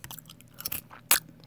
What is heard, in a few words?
eating yogurt stereo